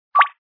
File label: affirmative